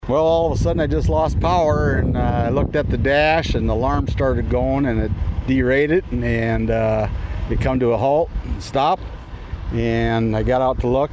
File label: tractor fire